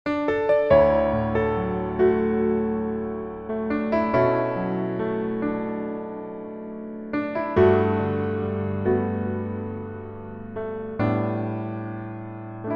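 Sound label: Piano version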